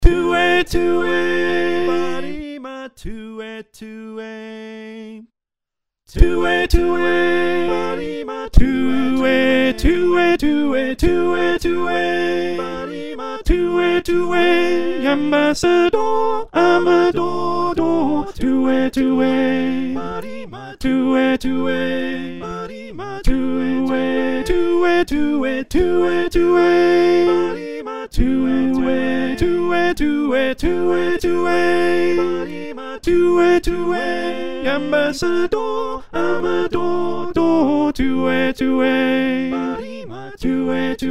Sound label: Alto